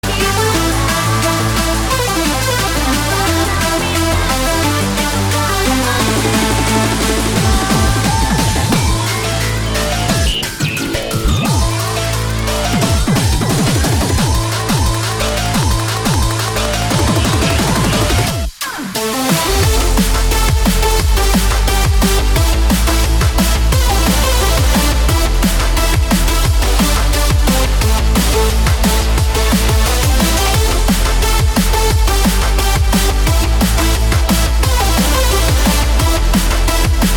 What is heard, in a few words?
Drum and Bass